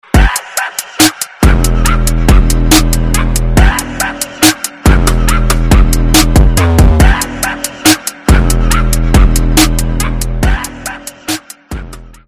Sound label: beat